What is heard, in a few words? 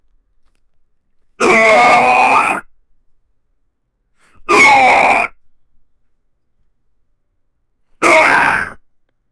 the sound someone makes w, effort